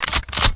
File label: spas12 pump